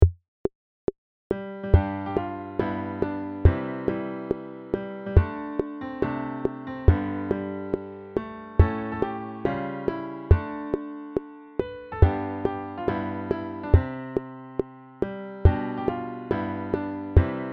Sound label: chords metronome